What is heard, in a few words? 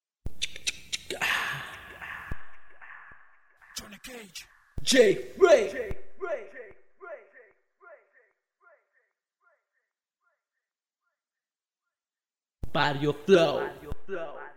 acapella